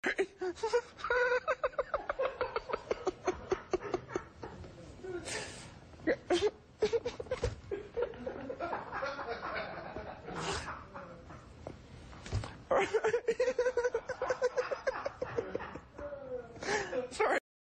giggle ringtone; anderson cooper

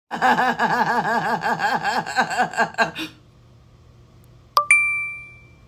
laugh Ringtone; apple pay

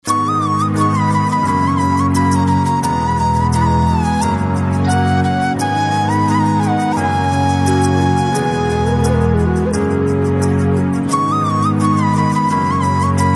Flute Ringtone

